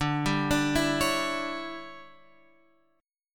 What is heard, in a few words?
D Major 7th Suspended 2nd Suspended 4th D, Guitar, Open F; DM7sus2sus4 arpeggiated